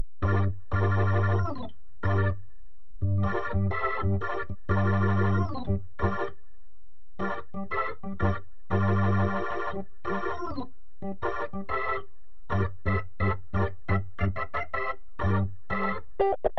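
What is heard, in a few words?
wet organ, Hybrid neural guitar overdrive; honeybee; Morphdrive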